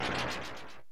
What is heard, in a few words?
charge shot; mega-man